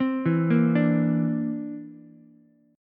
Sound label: D 7th Suspended 2nd Sharp 5th, Ukulele; D7sus2#5 arpeggiated